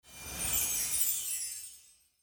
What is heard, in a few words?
magic